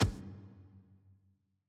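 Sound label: ambience room polygon punchbag training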